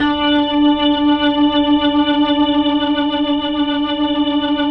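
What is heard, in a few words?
3D R, Slow Fast Hammond, Organs; E-MU Producer Series Vol. 5 – 3-D Audio Collection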